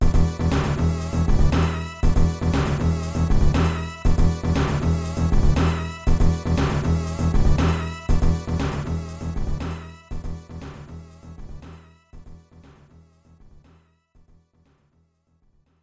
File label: Disco; Mortal Kombat II; Disco with Liu Kang